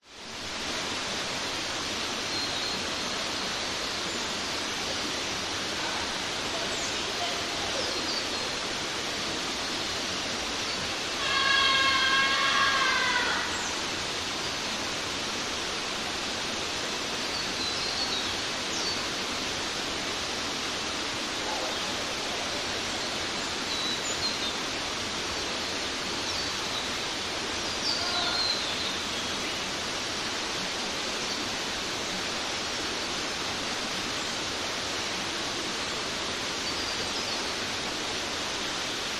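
nature creek forest water flowing birds young adults scream swim distance Queensland Australia; qld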